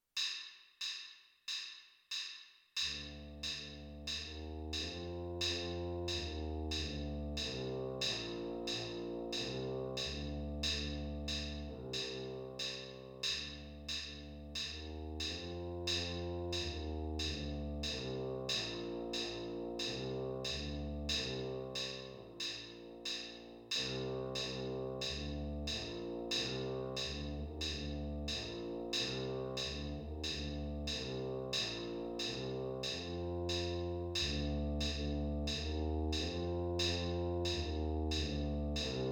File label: tuba